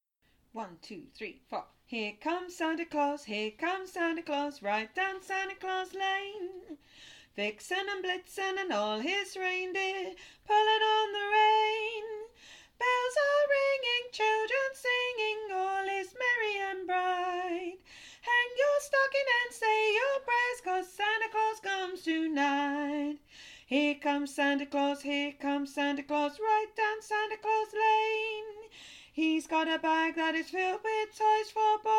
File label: BASS